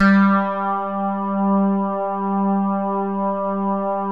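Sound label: SOLID STATE, BASSES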